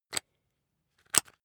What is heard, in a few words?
rock drill head attach regular; maschinen; bohrer; soundpool